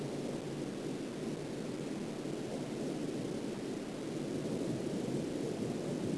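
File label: wave loop; KELP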